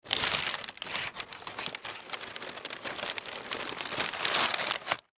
Paper Bag Pull Out Object Crinkle Lunch Packaging Brown; Blastwave; Foley